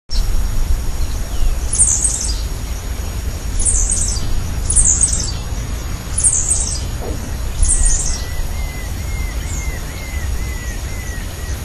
Tiaris obscurus; Parana Entre Rios; Jorge La Grotteria

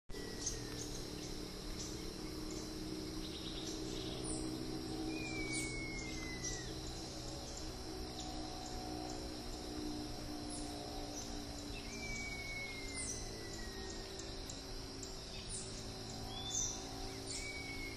Mackenziaena severa; Jorge La Grotteria

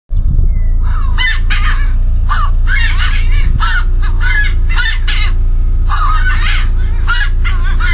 Aramides ypecaha; Ruta 40

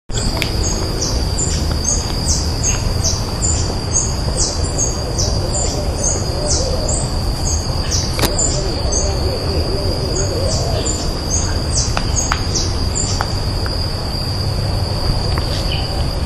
Poospiza cabanisi; Otamendi; Jorge La Grotteria

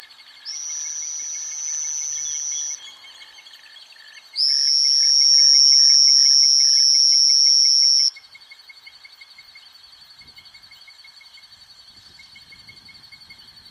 Elachistochleis bicolor; Chajari

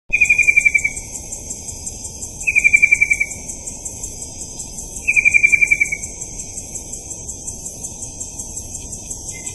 Hylopezus nattereri; Corredor Biologico U F

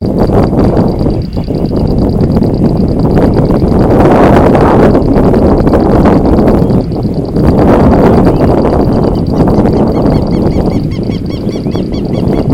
Pseudopaludicola falcipes, Machos; Machos que vocalizaban en un campo inundado.Partido de Lobos

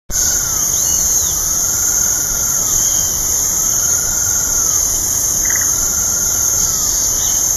Porzana albicollis; San Sebastian de la Selva; Jorge La Grotteria

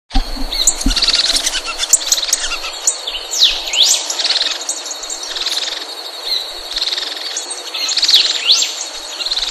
Camptostoma obsoletum pisteo; PN El Palmar

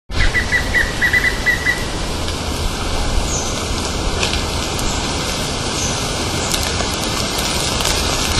Piprites chloris; Jorge La Grotteria

